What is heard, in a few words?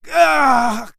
ugh male; ooh ahh; human